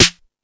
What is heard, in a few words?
Dance, Drum Machines